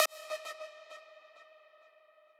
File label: lead